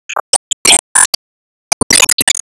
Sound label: Emo Warcry; Disney Star Smash; Android, iOS, Mobile